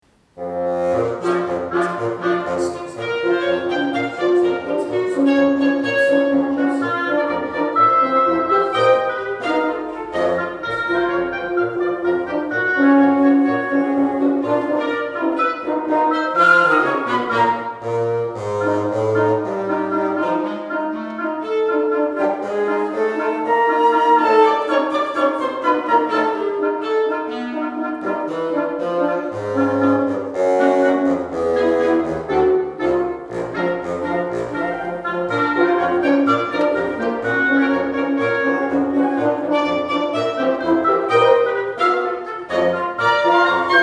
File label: Polka, wind